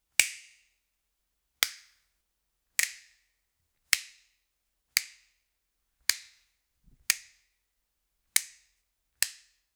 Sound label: tightening, equipment; riddell speed